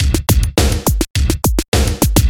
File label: Electronic Drum; Loop Samples; ACIDRockLFA; Sonic Foundry Demo CD